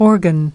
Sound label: organ; UNIT 5: HEALTHY LIVING